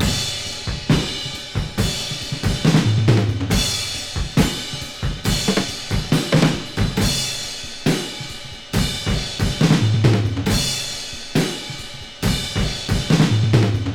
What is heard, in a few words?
69 bpm high quality drum loop g sharp key; drum loops